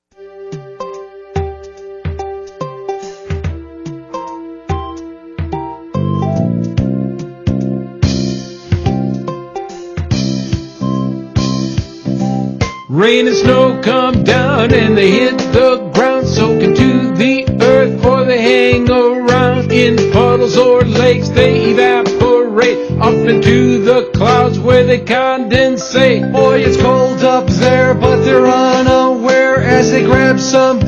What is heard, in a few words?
water cycle song; teachertube